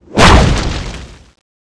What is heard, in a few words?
whip sword stab loop